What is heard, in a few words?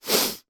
human man sniff